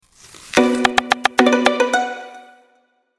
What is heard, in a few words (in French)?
Logo sonore; ENJOY SUSHI